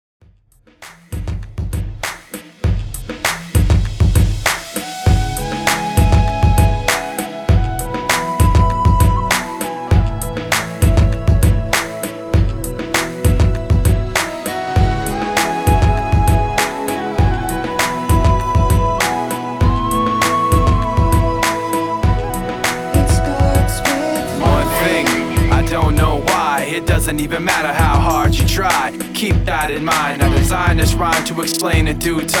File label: mashup 99 bpm